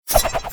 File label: p atk knifethrow